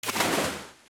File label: Zora Surface; Zelda; OOT